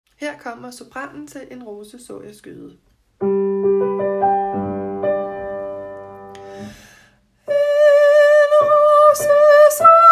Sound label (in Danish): sopran